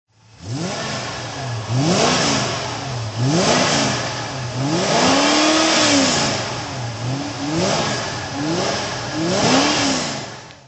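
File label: aston virage throttle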